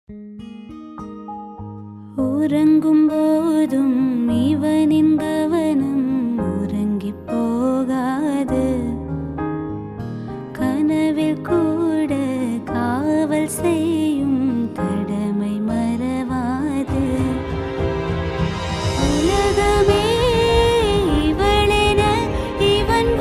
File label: Song Female Version